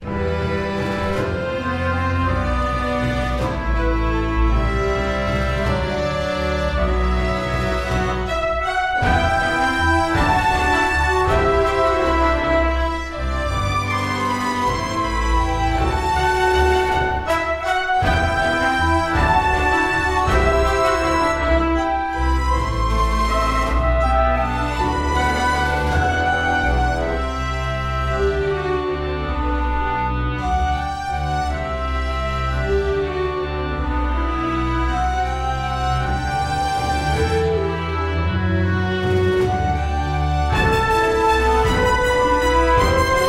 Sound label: epic